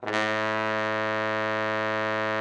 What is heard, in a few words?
TROMBONE, Killer Horns; Best Service